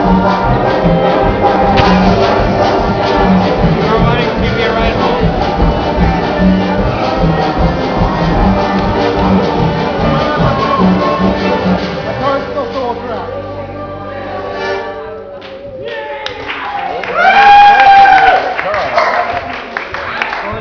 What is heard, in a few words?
Accordian Apocalypse